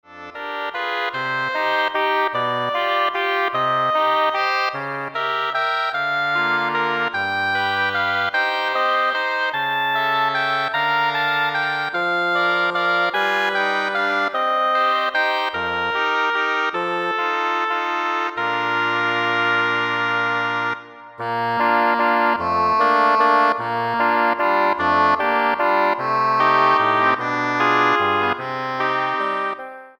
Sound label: Double Reed Ensemble